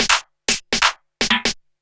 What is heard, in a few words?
124bpm, House Perc Loops